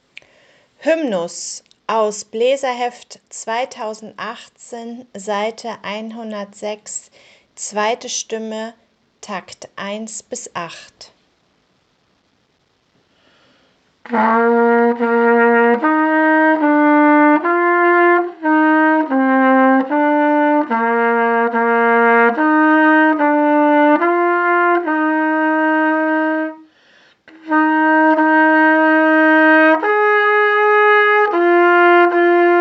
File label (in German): Hymnus Stimme 2, Posaunenchor